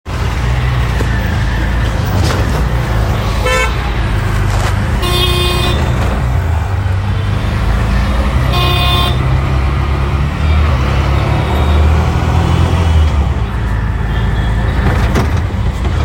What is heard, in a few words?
bandra west traffic; noise